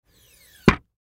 Chair Hydraulic, Office; Blastwave

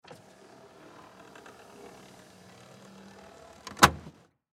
Semi Truck Window, Semi Truck Window Aird Roll Up; Trucks; Vehicles; Industry; Blastwave

